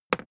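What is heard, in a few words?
briefcase plastic handle bounce hard, Büroarbeit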